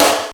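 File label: snare drum, FM drums; East West; Global Sounds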